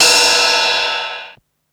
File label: Ride Filter, Ensoniq Mirage